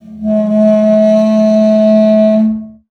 CELLO FINGER; 90 s Sample CDs; Xsample VOL-5

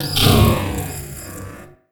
Metal

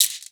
CABASA; Best Service ProSamples vol.42

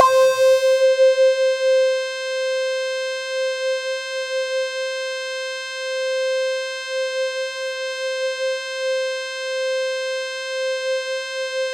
C5, SynthLine; 90 s Sample CDs; NiceBeats; SynthLine VOL-33

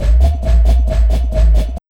FX, Big Beat Drumloops, 135

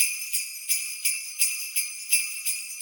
Sleigh Bells; Sonic Foundry (Sony Creative Software); Joe Vitale Latin Percussion

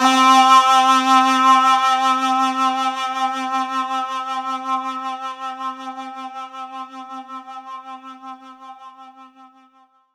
C4, SynthLine; 90 s Sample CDs; NiceBeats; SynthLine VOL-22

